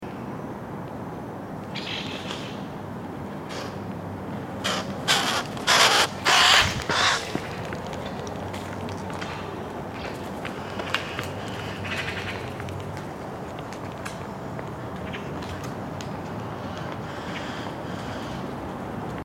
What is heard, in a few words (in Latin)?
Pica pica; SETE